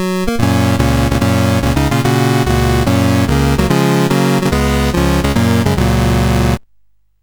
Summer Games, CSG8580R5

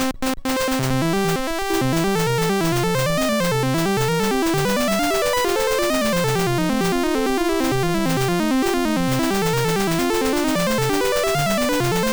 sid MOS6581R3; Galactic Muncher

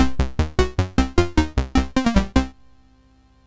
Security Alert, MOS6581R3

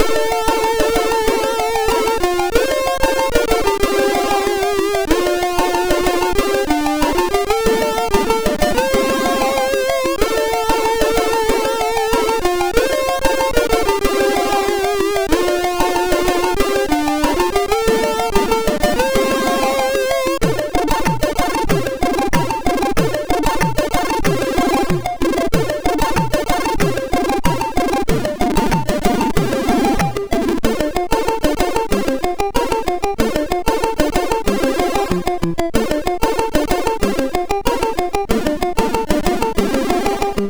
sid MOS6581R3; Spec-Wonder

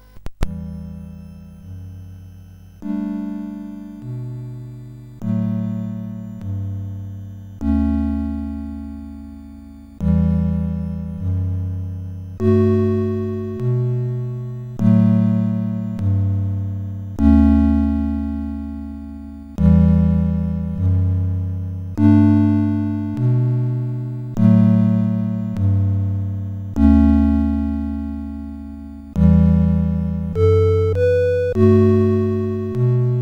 sid CSG8580R5

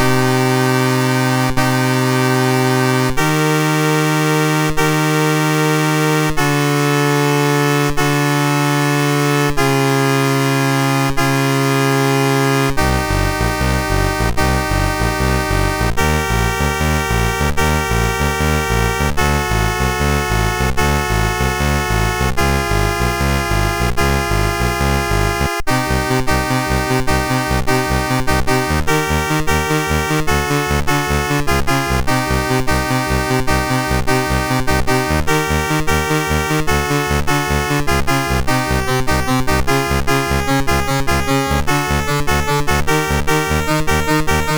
Speedway, MOS6581R3

